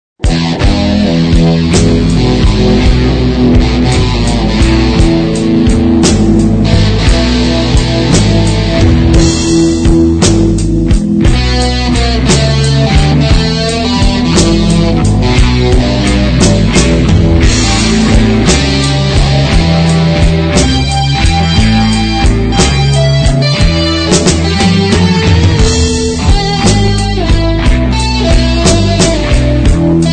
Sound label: metalic blues